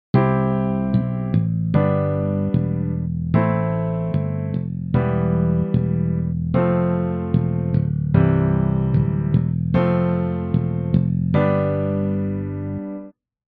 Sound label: bass